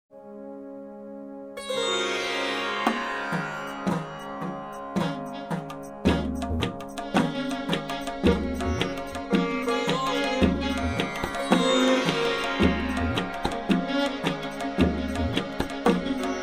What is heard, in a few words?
Indian Techno